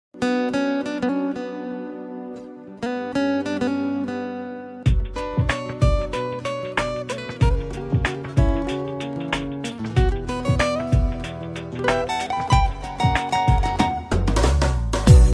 bgm ringtone jesus telugu song ringtone beautiful telugu christian song